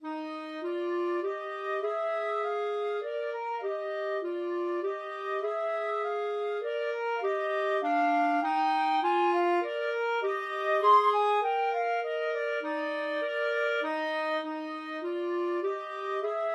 Menuett in clarinet flute clarinet duet

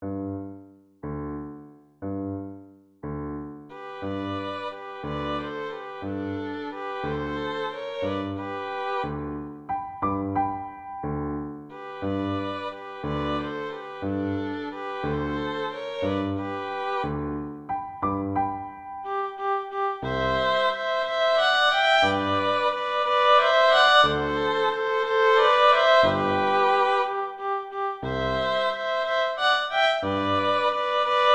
violin duet; capriccio

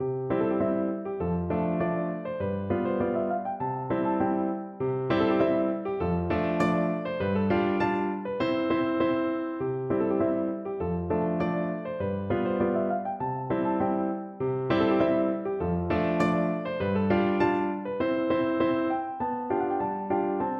Polka

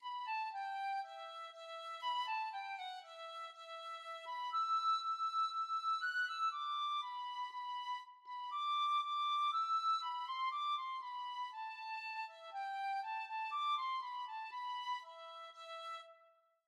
wraggle taggle gypsies, tin whistle

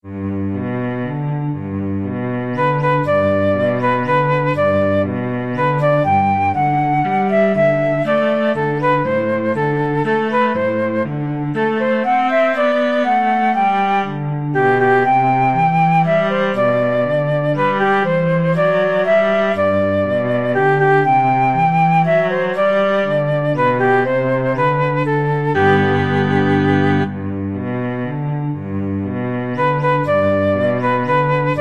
flute-cello duet

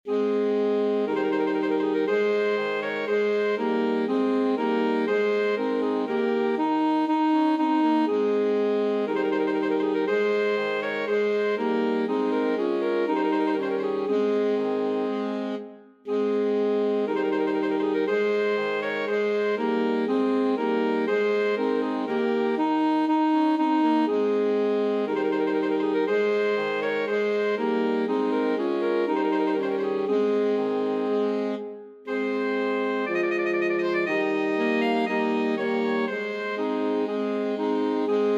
trumpet voluntary, alto sax quartet

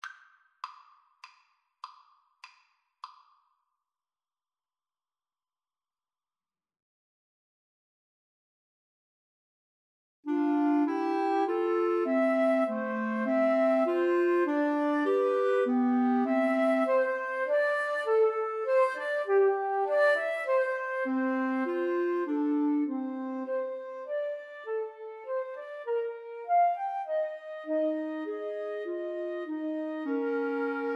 trio, 2 Flutes Clarinet

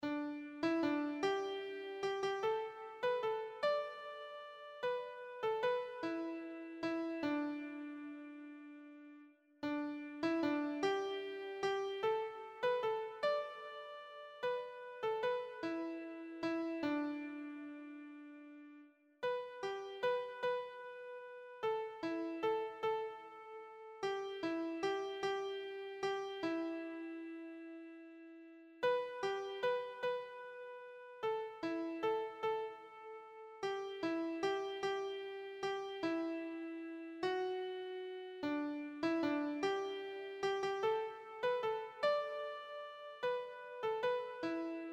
skye boat keyboard

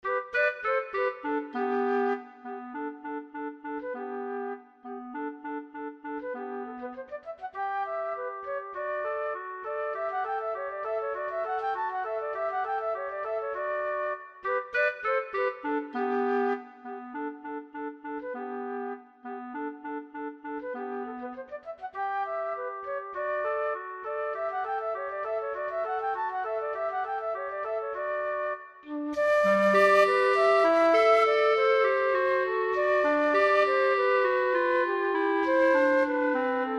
flute clarinet duet

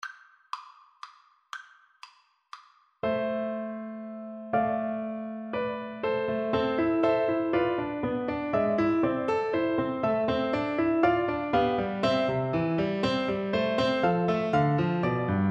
Oboe

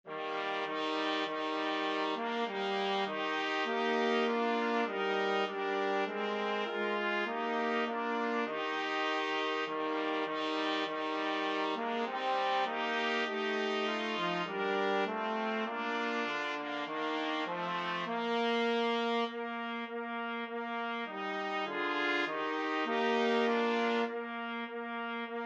2 trumpets trombone

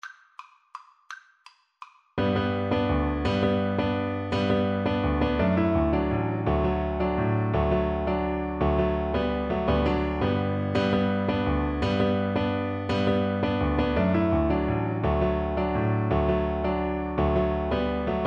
Viola

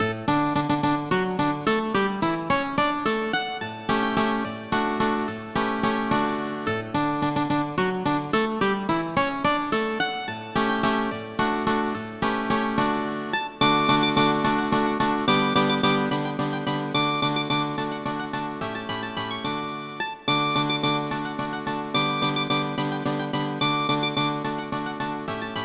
Der Postillon, mandolin guitar duet

